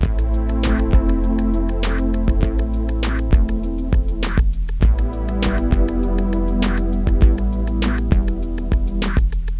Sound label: Background Music; ClubFusion; Twingo